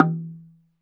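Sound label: SLIT DRUM E3 F; SLIT DRM1; Heart of Africa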